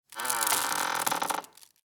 Hamper lid open creak; basket

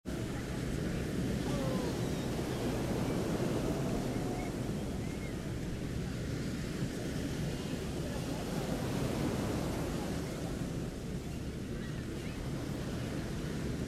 Seaside ambience quiet morning waves on sand

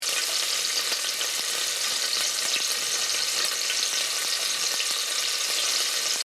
Hot oil sizzle cooking loop

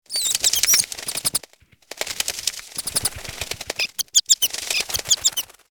Bat wings flapping flight squeak

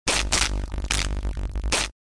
Electric interference in communication systems

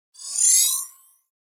Digital text fade in

